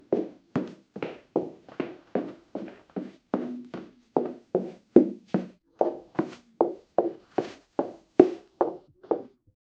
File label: Indian female footsteps